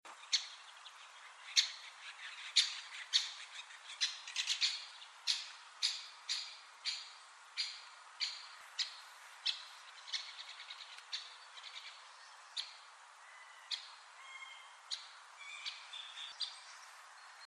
nagy fakopáncs hangja

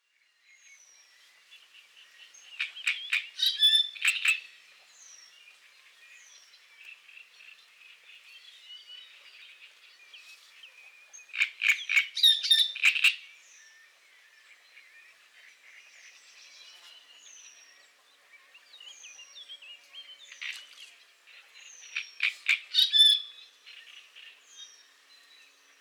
nádirigó hangja

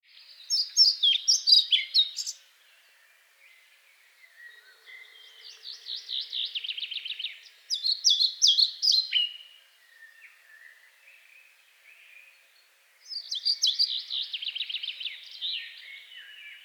kormos légykapó hangja

